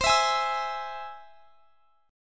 C Augmented down; strummed

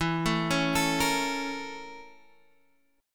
A sharp Minor Major 7th Flat 5th

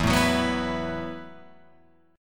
E Suspended 2nd Suspended 4th E, Guitar, Open F; strummed; JGuitar

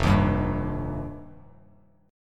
F 7th Suspended 2nd, Bass Standard; F7sus2; strummed; JGuitar

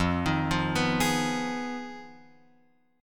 F Minor 7th Flat 5th, Guitar, Open F; Fm7b5 arpeggiated; x,0,3,0,2,3

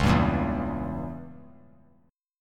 C sharp Diminished 7th down, chord; C#dim7 strummed; JGuitar

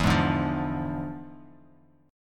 E 7th Flat 9th, chord

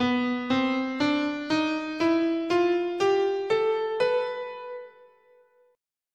B Eight tone Spanish, scale

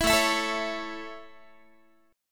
A Major, Guitar; strummed

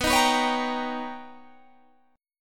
B Augmented Major 9th B, chord

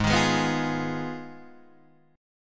B 6th Flat 5th A flat, guitar chord

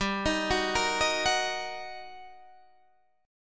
G Minor Major 7th Sharp 5th, down, Guitar, Open D

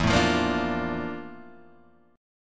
F Major 13th E, guitar chord

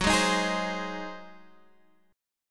G sharp 7th F sharp

